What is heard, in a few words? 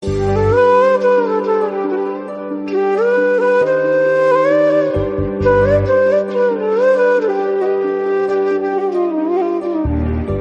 flute